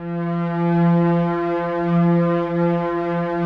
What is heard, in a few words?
ATMO PADS; Best Service; XX Large